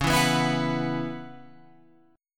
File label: C sharp Minor 11th, Guitar Open F; C#m11 strummed